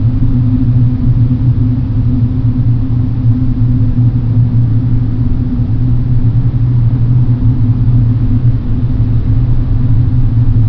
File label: tunnel